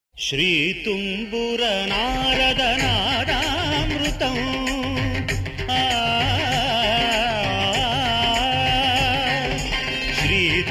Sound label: thumbura, ringtones; sri narada; blues